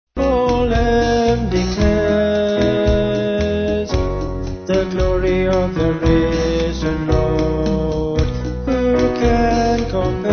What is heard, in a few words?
Vocals Band